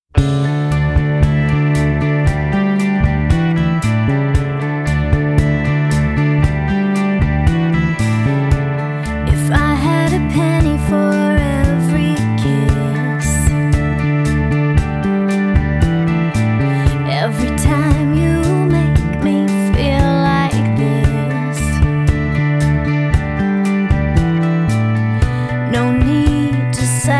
Pop Singer

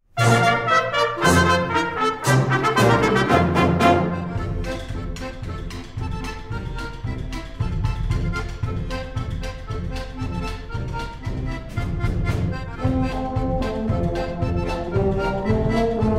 German Band, Polka; Washington DC

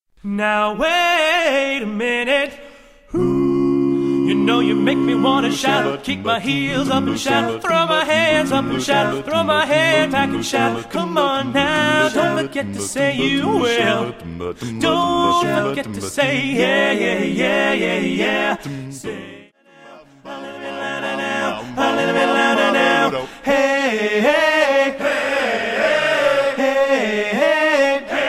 Barbershop Quartet

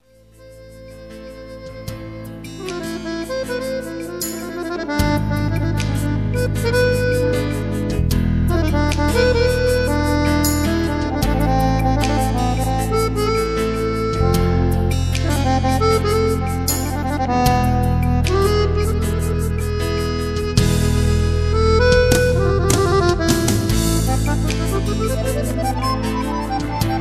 Accordionist; Houston; This Houston Accordionist was born in Italy

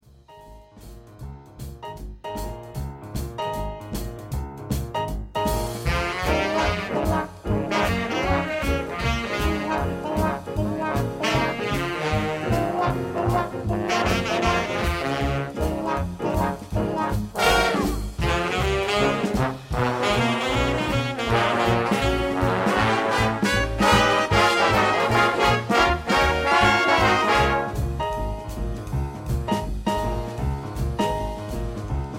Big Band; Washington DC

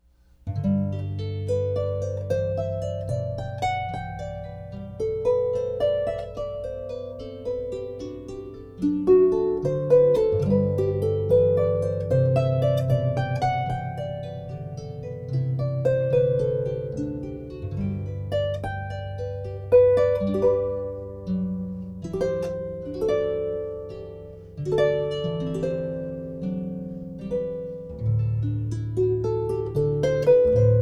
Harpist

